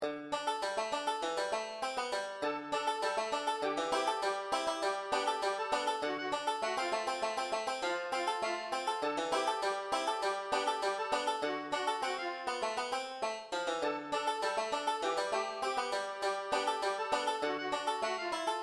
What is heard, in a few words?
5 string banjo d, Banjo Tab